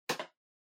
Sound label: PC-Keyboard (Far-Space-Hard)